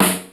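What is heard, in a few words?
SY77 snare drum; Crypton - DrumBase5000; SY77 DRUMS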